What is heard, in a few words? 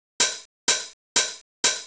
HOUSE HIHAT 125; Beats